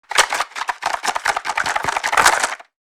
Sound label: Shaking small box board game contents